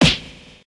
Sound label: Punch; SSB